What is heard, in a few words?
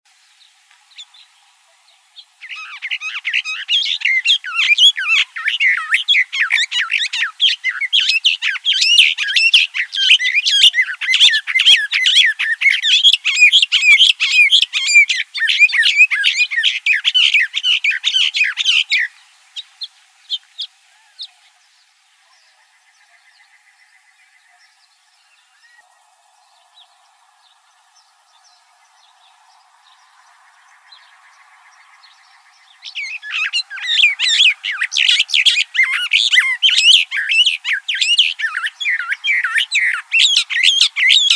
BETH, Mimids; AZFO; deviche